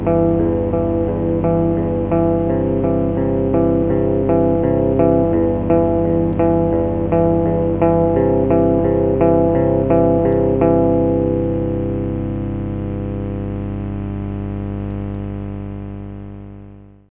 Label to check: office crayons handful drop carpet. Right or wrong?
Wrong